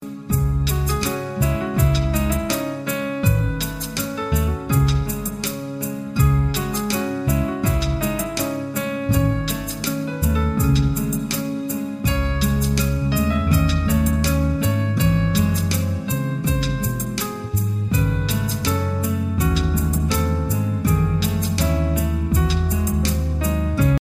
Piano Solo

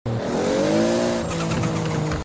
Turbo flutter

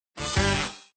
Trombone

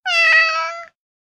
Cat Miau

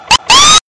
Police Wail

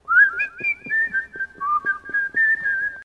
Raindrops Whistle

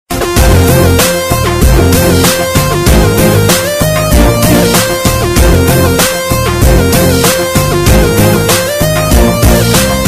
Rock

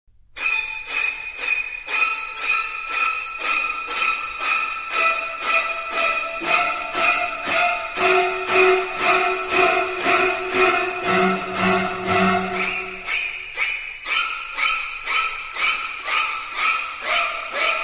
Scary Horror

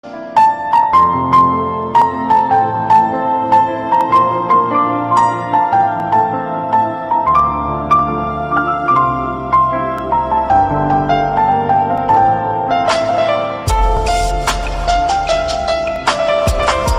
Slow Mix

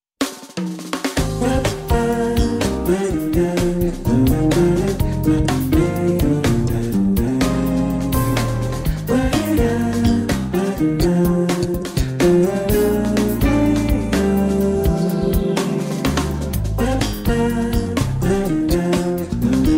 Jazz Ring

